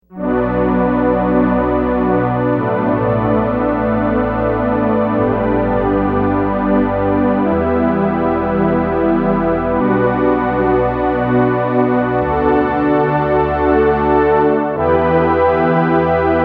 Juno Pad

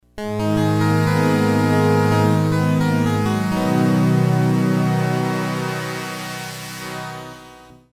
Harpsi Plus; Kris Rhen Presets

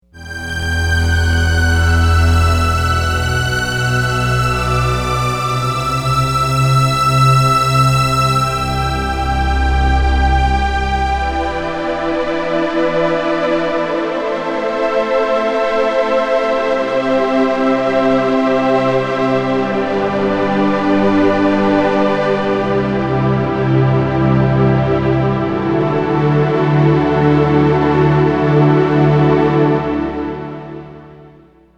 Weepy Strings

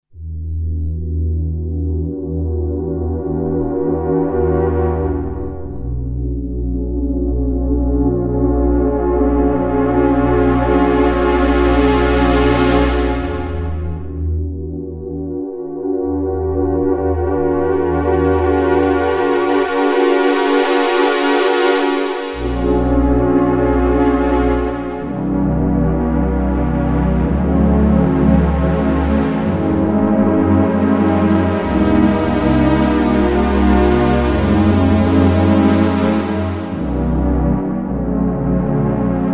JX Pad

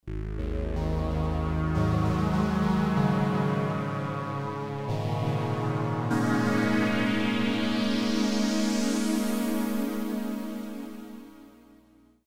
Silk Pad; Kris Rhen Presets

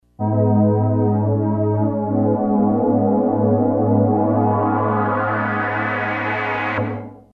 Analogue Voices; Kris Rhen Presets

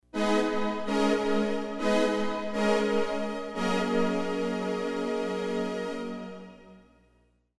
Arco Strings; Kris Rhen Presets

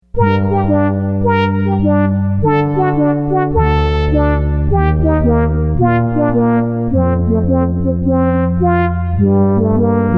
BRASS Hear Ye Hear Ye

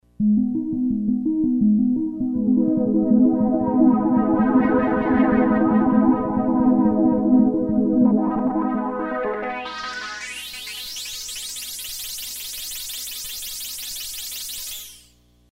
Mod Whl Up BP Sweep; Kris Rhen Presets

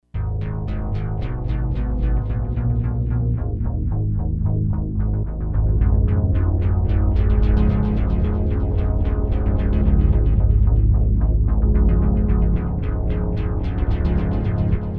Pumpin Bass; Kris Rhen Presets

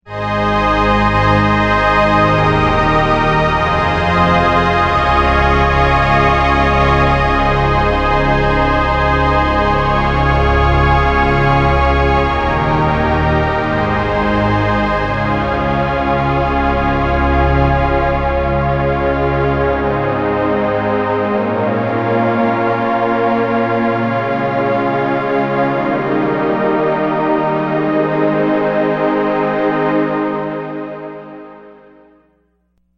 SYNTH Space Strings

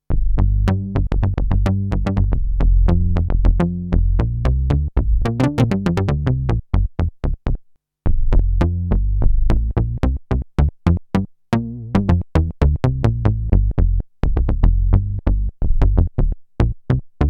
Mini Snap Bass Feedback

